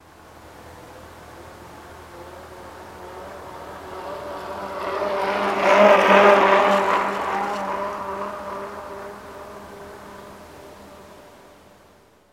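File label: Bicycle By Medium Speed Tire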